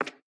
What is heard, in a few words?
Peg Leg Footstep